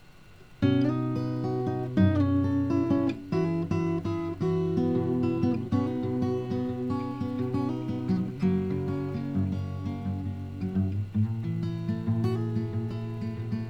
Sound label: guitar, intro